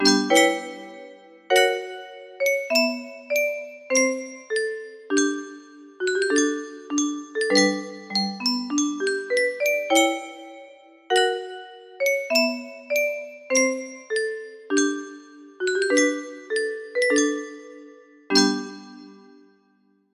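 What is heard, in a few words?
wedding march short version, music box melody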